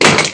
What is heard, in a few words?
DROP GUN; Messiah; WEAPON